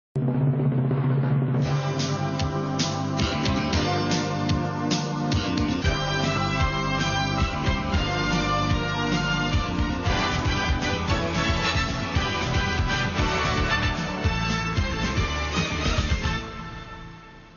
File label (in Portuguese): vinheta de abertura do jornal; jornal nacional